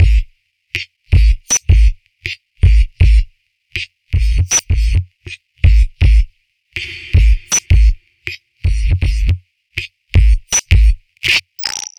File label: Structured Rhythm, IDM Rhythms